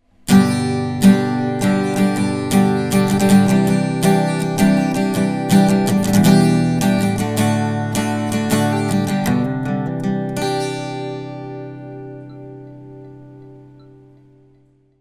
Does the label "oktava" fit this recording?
Yes